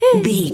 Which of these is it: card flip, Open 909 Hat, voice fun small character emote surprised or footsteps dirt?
voice fun small character emote surprised